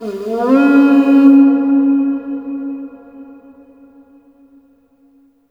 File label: HRNPHR, Heart of Africa; AMB. HRN FX